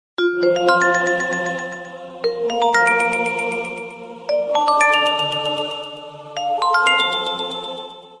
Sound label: Samsung Ringtone